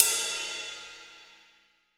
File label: Rock Ride, cymbals; Roland BK-5